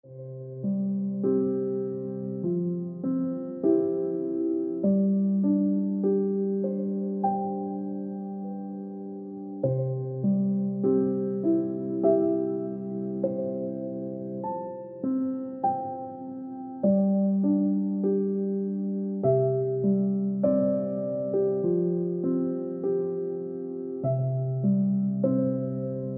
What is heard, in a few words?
- Instant Ringtone Piano Music